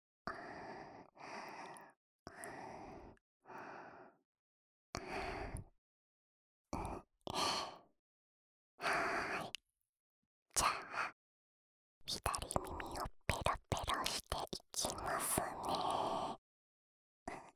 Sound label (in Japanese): ふかーく、ねっとり、舐めちゃいます